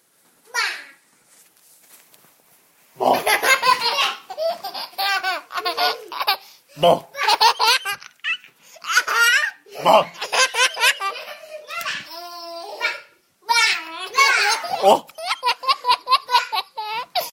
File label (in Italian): la risata della buona notte